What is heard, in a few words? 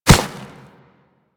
Kubrow Step Snow, Footsteps; Enemies; Warframe